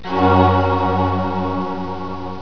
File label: chest; graal2001